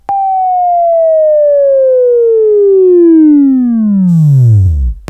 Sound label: Buzz; Sweeping Training